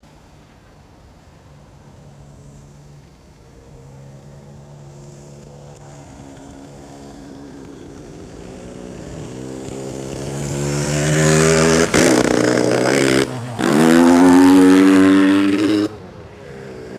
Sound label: motorcycle approaches passes by and accelerates; distant motorcycle engine accelerating; vehicles